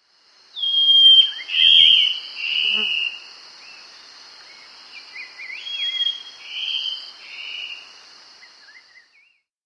Black-Spotted Bare-Eye, Nature's Songs, S. America